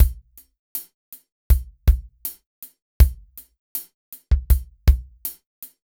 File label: 70s Ballad Drums